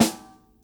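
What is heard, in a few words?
snare drum, acoustic drum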